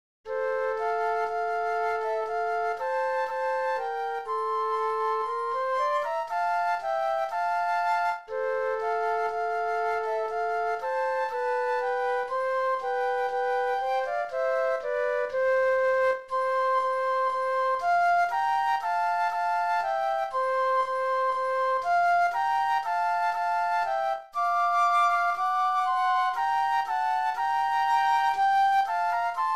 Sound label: Flute Duet; christmas